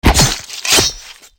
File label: hit stab, knife; csgoknife